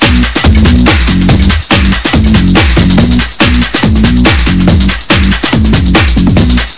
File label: music; Pinball